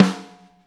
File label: Snare, Drum & Bass; 90 s Sample CDs; Total Drum&Bass